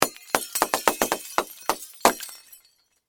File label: Crash Light Bulbs, Crash, Light, Bulbs, Smash, In, Series; Glass; Materials; BlastWave